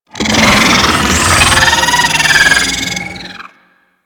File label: Pet Vocal Death; Enemies; Vocals; Warframe; Alad V Pet